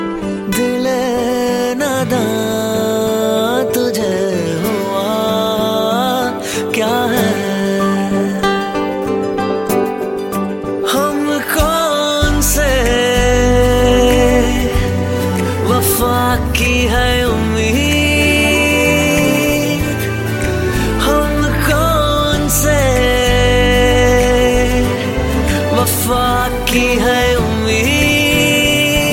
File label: Sad Hindi